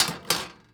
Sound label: Pistol Set Down On Metal Surface, weapons; AdobeCC